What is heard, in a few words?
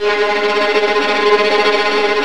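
TREMOLANDI, Tremolandi Violins; E-MU Producer Series Vol. 2 – More Studio Essentials; Composer; Tremolandi Vlns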